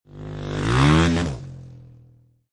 Motorcycle Dirt Bike Driving Off Jump Left To Right; Motorcycle motor. Motorbike type offro; Lynne Music